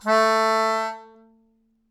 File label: Melodica Long, Long Notes Chromatic